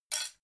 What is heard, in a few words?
dough hook drop deep; industrie-wirtschaft; gastronomie